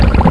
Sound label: FLY; BANZAI